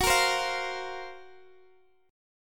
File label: F sharp Major 7th Flat 5th F sharp, Guitar Open D